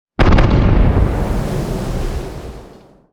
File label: super heavy battleship defend; Hearts of Iron IV Death or Dishonor